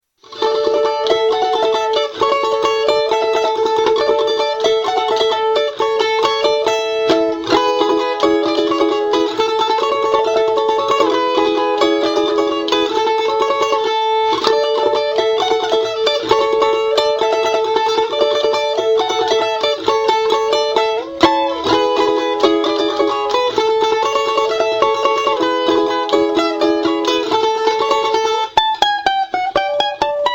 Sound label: balalaika; instruments